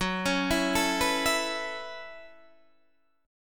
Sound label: Gb Minor 11th Gb Guitar DADGAD